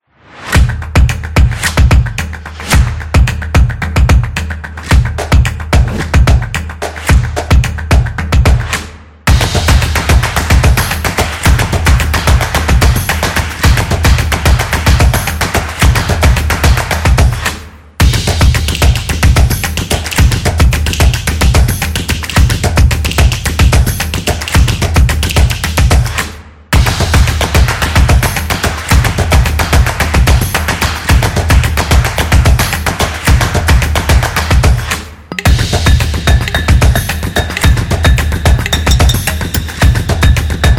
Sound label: Percussion Upbeat